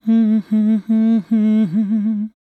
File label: voice humming